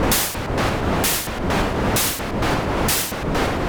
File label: Gated Seq Noise, Gate Filtered 130BPM